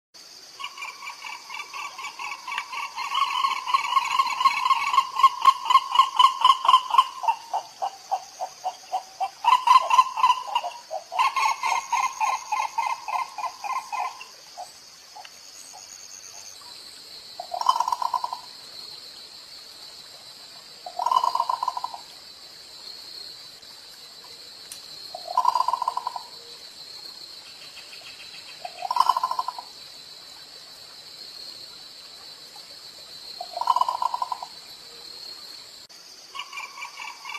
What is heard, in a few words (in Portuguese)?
Canto do ibis verde oliva